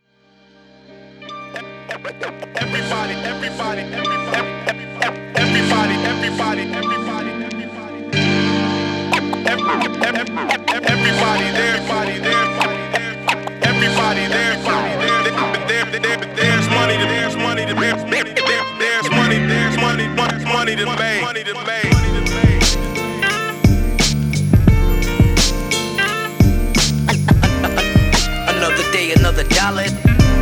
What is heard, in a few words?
Instrumental